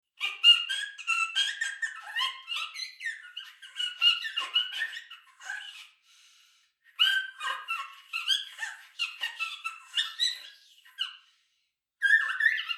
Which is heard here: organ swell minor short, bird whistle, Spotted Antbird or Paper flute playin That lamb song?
bird whistle